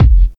natural kick single hit f sharp key, Royality free bass drum one shot tuned to the F# note